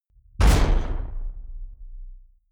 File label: sci-fi gun mechanic fire soft